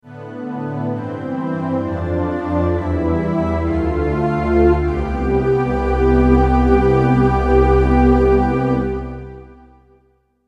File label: pad